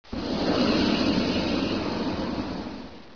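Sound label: flamethrower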